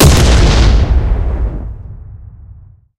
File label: tanks explosion; War Thunder